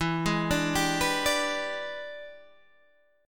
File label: E 7th Sharp 9th, chord